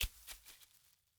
Sports Helmet Dropping On Grass

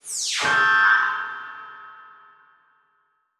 Transition Laser Horn; Adobe; Production Element

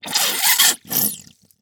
Foley Water Toy Empty Squeeze Spurt

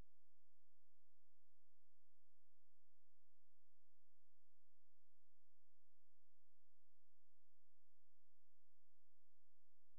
Pink Noise Inverse

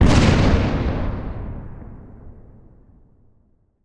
Explosion Mine Blast Long; Fire and Explosions

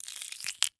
Horror Scary Skin Rip Wet

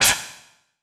Transition Hit Impact Short; Adobe; Production Element

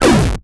Flash Animation Short

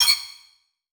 Transition Hit Impact Ping; Production Element

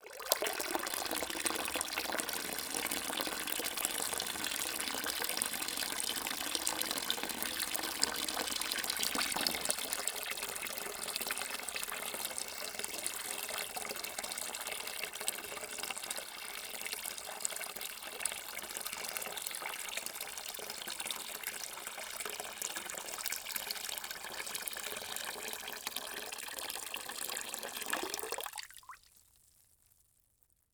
Liquid Water Pouring

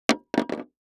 Impact Wood Board Drop On Wood Surface; Impacts

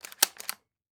Weapon Gun Machine Gun Thompson Sub Bolt Action; AdobeCC

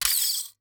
Foley Toy Magnum 45 Gun Firing

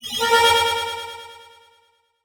Title Transition Delay Short

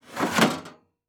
Sports Grill Metal Grease Trap Sliding In

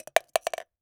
Sports Golf Ball Dropping In Hole

